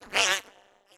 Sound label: Goblin